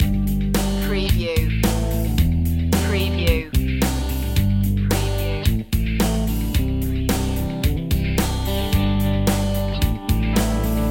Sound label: poprock loop